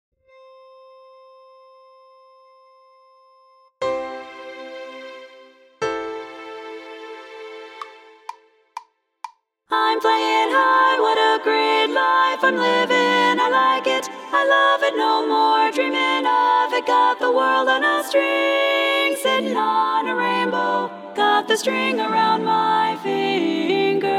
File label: tenor, chorus